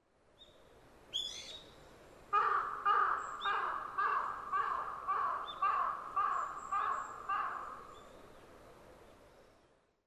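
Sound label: large-billed crow; hasibutogarasu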